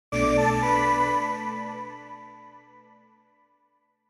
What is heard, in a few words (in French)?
synthe; Logos